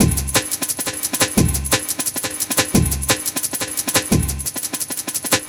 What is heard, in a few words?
classic dnb breaks, C#, 175